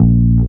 BAS TON; E-Lab; X Static Goldmine 2; akai

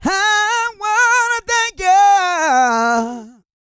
GOSPEL; Spectrasonics; Vocal Planet Vol.1 Gospel; akai

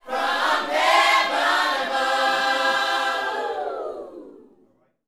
YOUTH CH PHRASE, Vocal Planet Gospel

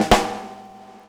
DRMROLL; E-Lab; X Static Goldmine 2; akai

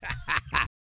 LAUGH, Vocal Jazz Fx

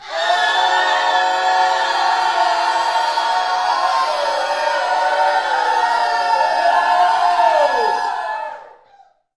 SCREAMS, Gospel; Spectrasonics; Vocal Planet Vol.1 Gospel; akai; UYC SHOUTS

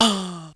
VOX; ZERO-G; Datafile

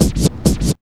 SCRTCH

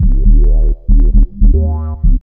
B LOOP; E-Lab; X Static Goldmine 1

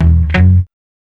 BASPIK; E-Lab; X Static Goldmine 1

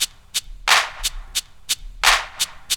LOOP; E-Lab; X Static Goldmine 2

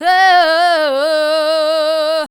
voice spectral, ly short

